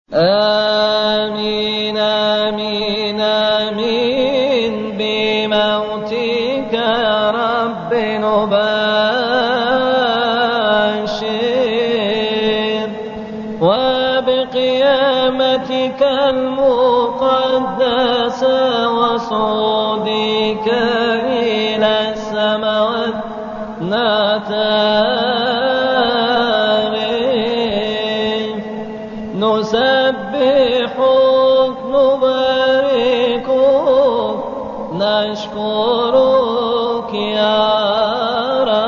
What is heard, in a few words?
amin amin amin arabic